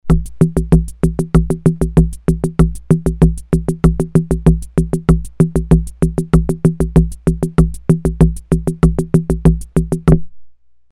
MIXED, Ace Tone Rhythm Ace FR-2L

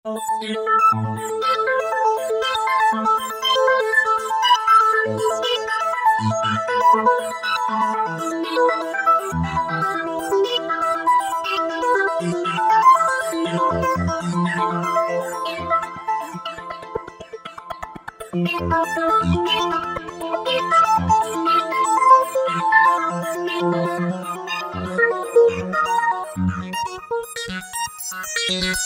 Roland V-Synth Talking Steps; Factory Patches

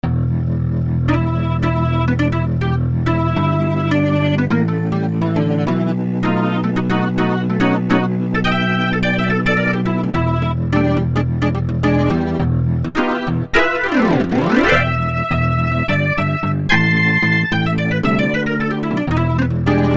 Organ 2, Roland U-220; Factory Timbres

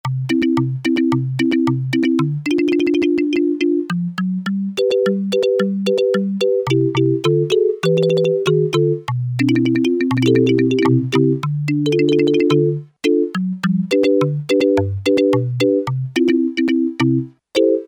MARIMBA, Yamaha SHS-10

